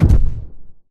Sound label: footstep generic dist; Titanfall 2